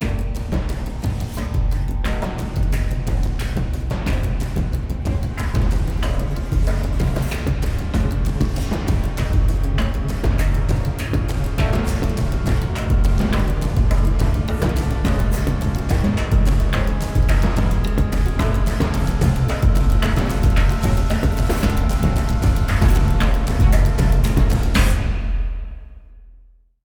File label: linear Fight